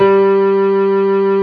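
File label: reverse piano G2; AKAI S-Series CD-ROM Sound Library VOL-2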